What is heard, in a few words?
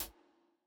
hall resonance hihat